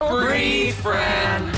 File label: Breathe